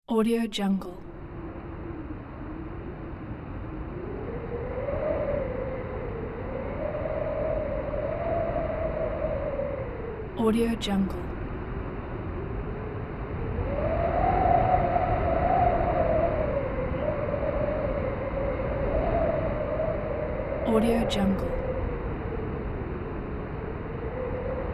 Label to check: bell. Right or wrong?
Wrong